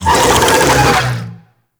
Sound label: Infested Big Ashen Vocal Attack; Warframe